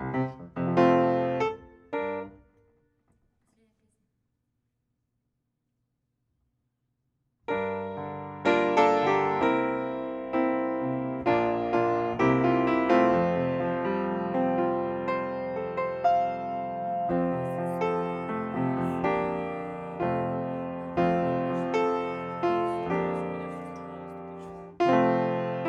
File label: piano